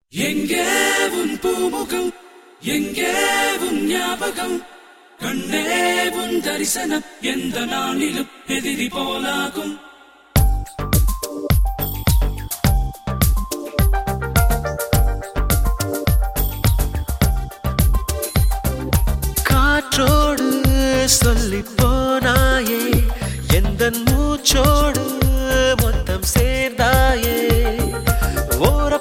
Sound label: Nice Melody